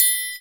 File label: TRI, triangles; Univers Sons; Basicussions